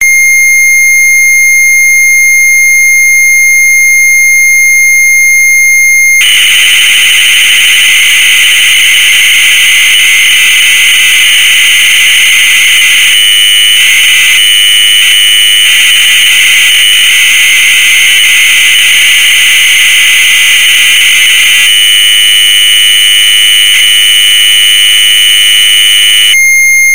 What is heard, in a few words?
load tape; z80; 64char; digital group; z80 software digital copy; hamdemo